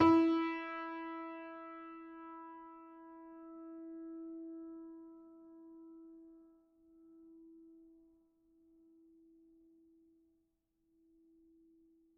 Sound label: GPiano sus E3, Grand Piano, Kawai; Chordophones; Sustains